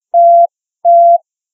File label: communicator hailing beep